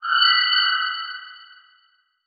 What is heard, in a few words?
Transition Musical Tone Warble